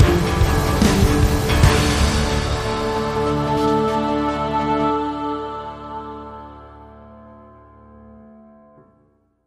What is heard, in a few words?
Dark Orchestra Stinger